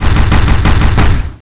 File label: tentacle, scorpion